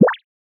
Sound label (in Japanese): ぴょこ